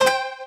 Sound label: item get